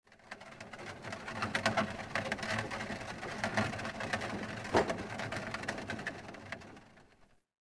City Ancient Small Cart; Ambient; Civ4 beyond sword

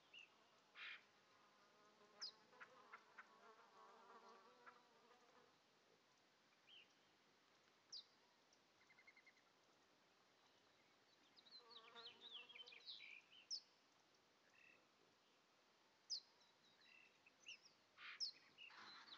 ambience birds medium; Rust

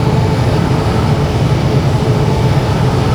polycraft engine

